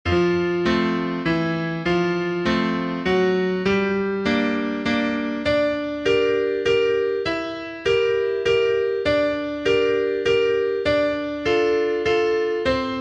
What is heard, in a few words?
piano, sheet music